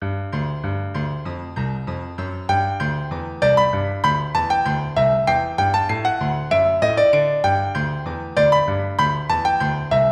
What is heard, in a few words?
piano; 可愛らしい子犬が戯けている様子をピアノが奏でます。; ショートループ